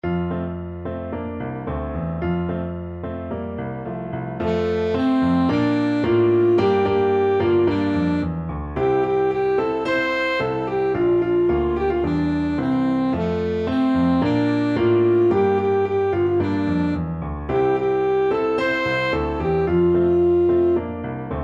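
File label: alto saxophone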